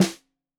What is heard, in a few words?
Snare 65 Reg Stereo, Deeply Sampled Drums